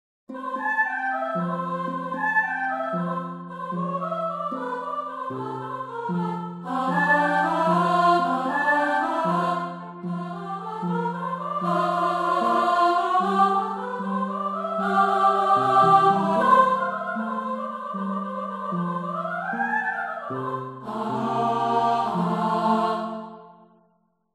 Choir Alto

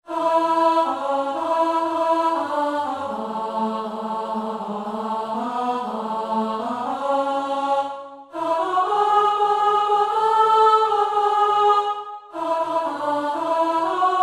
Currency Lads & Lasses Altos

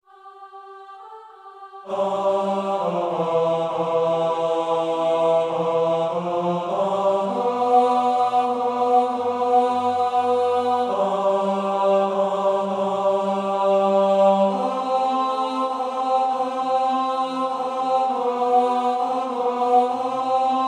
Tenor; Silent Night

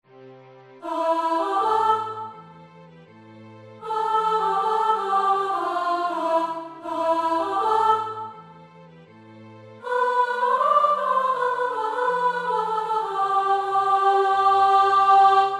Chorus + Verses Bottom

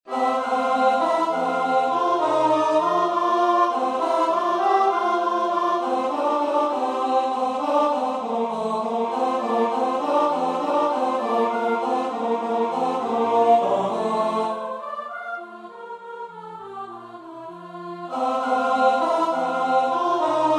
Tenors

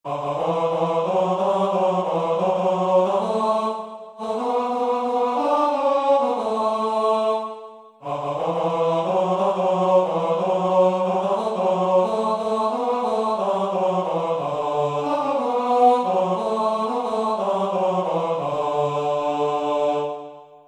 Scum of the Earth Bar 502 Tenors

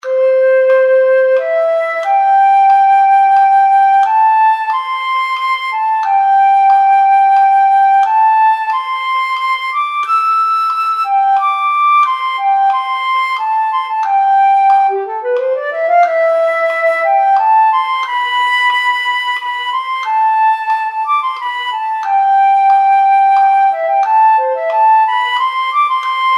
Flute

